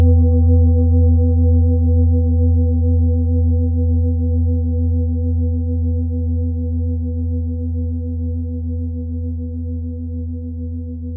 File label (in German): klangschale nepal